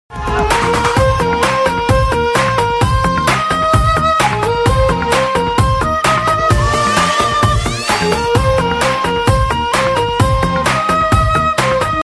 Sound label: instrumental